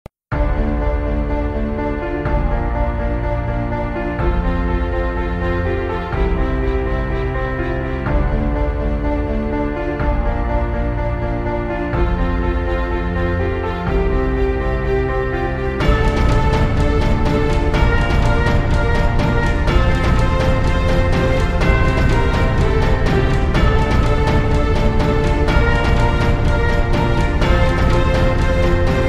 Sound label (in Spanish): Musica Epica Para Videos Y Publicidad Musica de Fondo para Videos